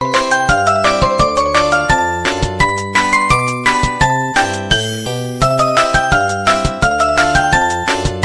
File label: ringtone